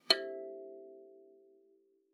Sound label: KALIMBA E5; Xsample VOL-7